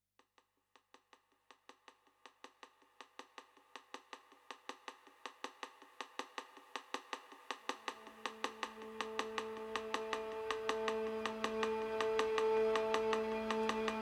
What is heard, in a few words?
Ticking Drone; Deep Nordic Drones